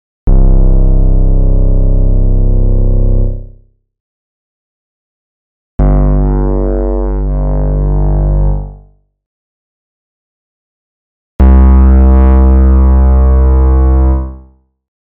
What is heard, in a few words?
HOLLOW FUZZ Arturia Modular V3